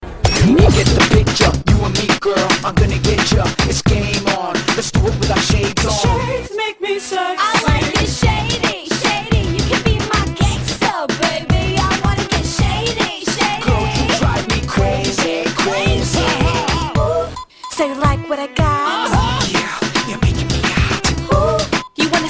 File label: Hip-hop Ad